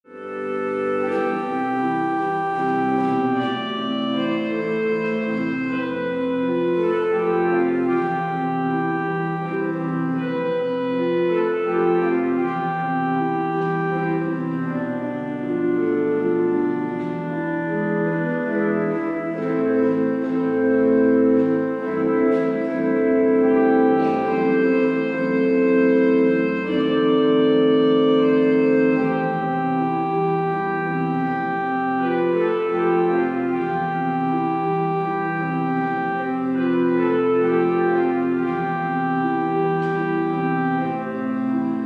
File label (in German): Orgel